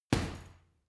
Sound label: boxing punching bag uppercut hard